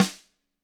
GRCH HI, GRETSCH SNR; ILIO; Double Platinum Drums 2

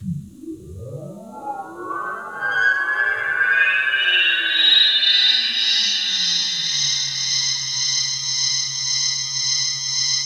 DREAMFILTER, Keys; 3D Sound; 3D Keys VOL-1

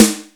R-8 radio s, Power Drums; Power Drums U.S.A

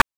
PITCHED NOISE; NES DPCM; MIDINES

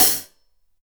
12, Drums; Big Fish Audio; Ross Garfield Drums VOL-2

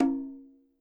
CH h, KILLER CONGA

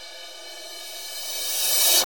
Reverse Cymbal; Roland BK-5

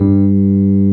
SUITCASE, Keyboards Old School

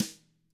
DW BR, DW BRSNR; ILIO; Double Platinum Drums 1

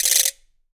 RATCHET

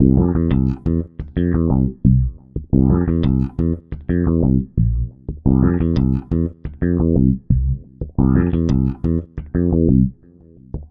Filtered Funky Bass, Electric Bass

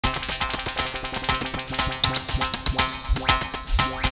120, drumloops, DrumMachines; X-Static Goldmine

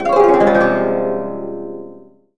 KOTO GLISS C1; AKAI S-Series CD-ROM Sound Library VOL-7

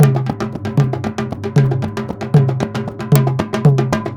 India, Heart of Asia

